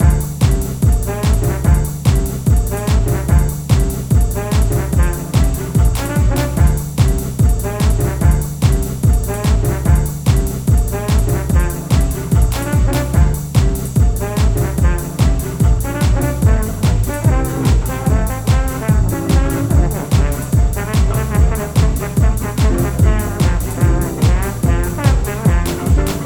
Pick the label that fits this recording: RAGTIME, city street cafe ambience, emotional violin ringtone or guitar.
RAGTIME